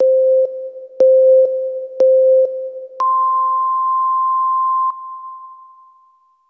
zihou

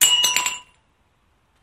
garasuhen

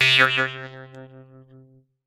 biyoooon

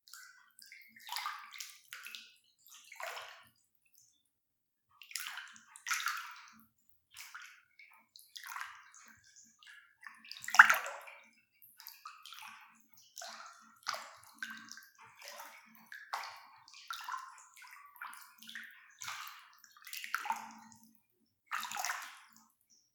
bath picha

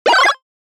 nyokinyoki; 【効果音】何かがにょきにょきと生える; 何かがニョキニョキと生えてくるイメージのエフェクト効果音素材です。

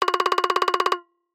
katakata

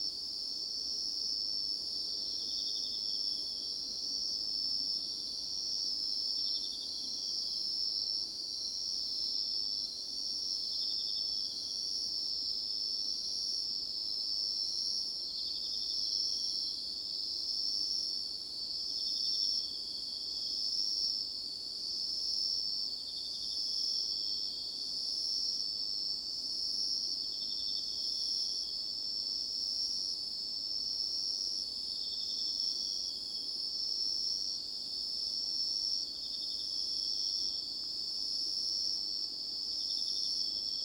musinones, ループ

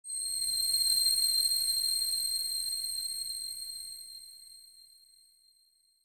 syuwin

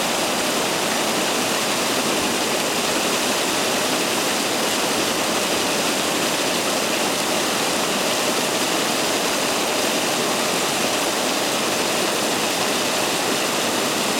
funsui

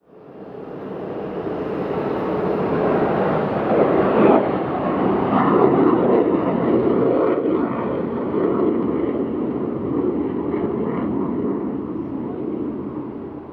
sentouki

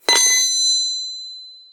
gakin

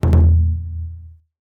wadodon; Taiko accent (sound effects)

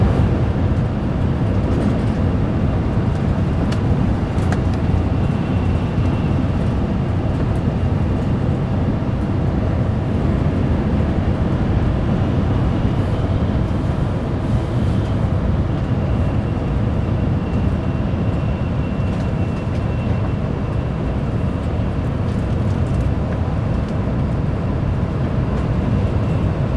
sinkansen

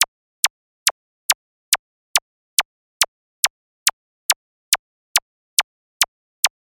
tekuteku

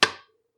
goisi

